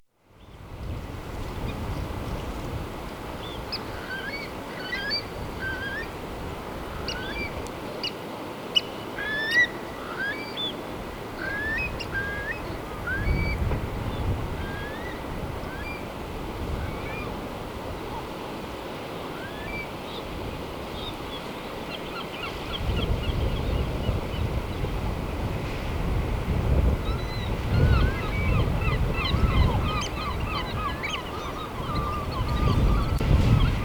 ptitsi u morskogo poberezhya, zvuki prirodi

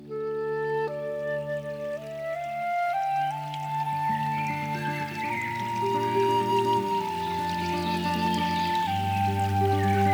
malenkii lesnoi ruchei v mae

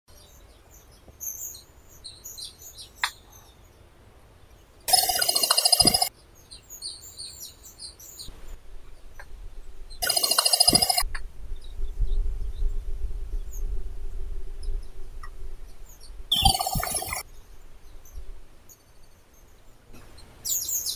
pogonish bolshoi porzana paykullii; zvuki prirodi

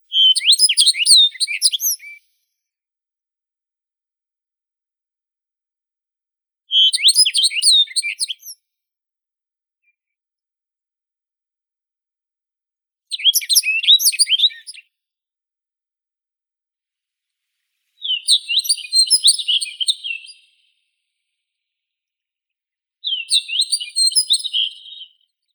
ovsyanka remez emberiza rustica

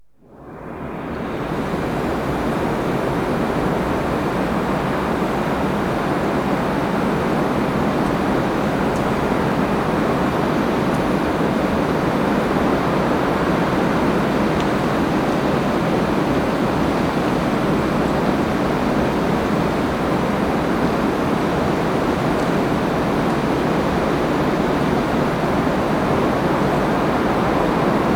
noch na selskih prostorah

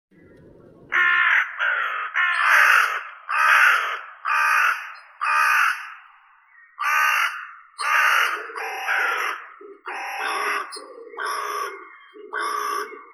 vorona chernaya evropeiskaya corvus corone, zvuki prirodi

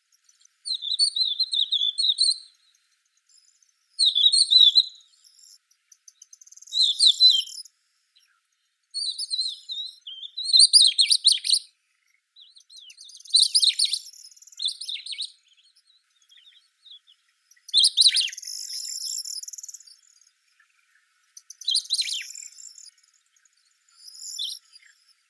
zhavoronok rogatii eremophila alpestris, zvuki prirodi

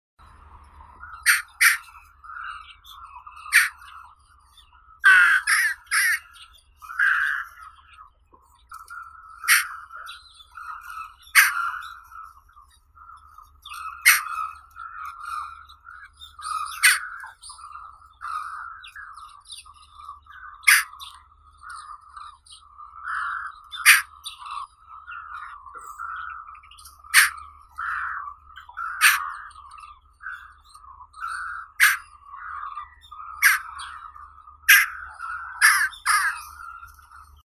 galka daurskaya corvus dauuricus

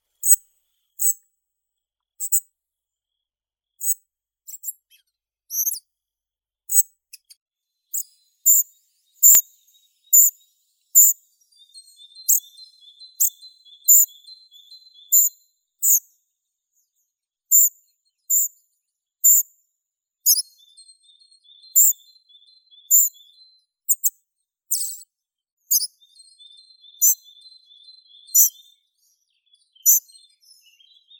muholovka seraya muscicapa striata

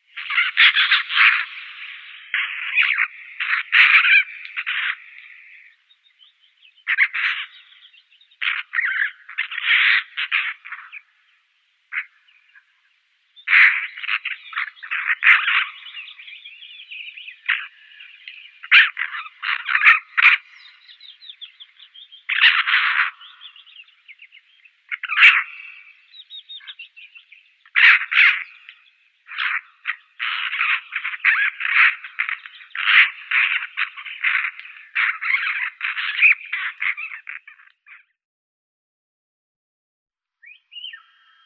soroka pica pica, zvuki prirodi

